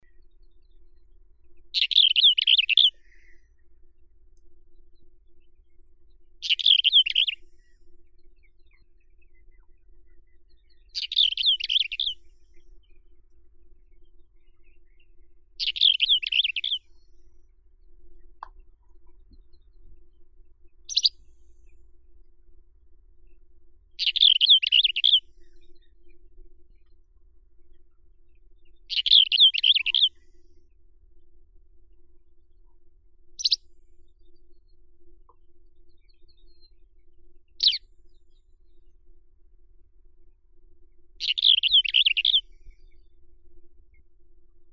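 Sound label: Spanish wheatear, Oenanthe hispanica; kamenka ispanskaya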